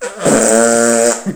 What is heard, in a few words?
explosion